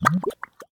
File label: Mud Gulb; Zombie Catchers